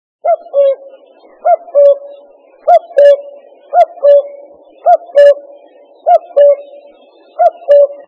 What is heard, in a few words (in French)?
Coucou, Oiseaux; Sons Oiseaux